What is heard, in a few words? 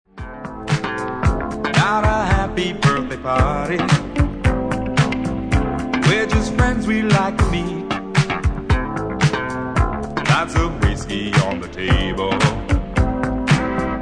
vocal